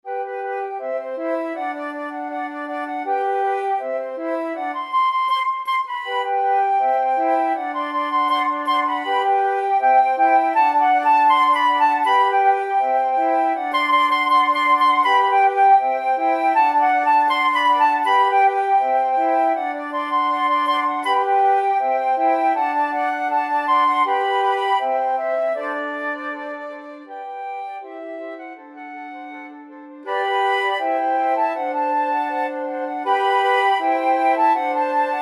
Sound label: la bamba, flute quartet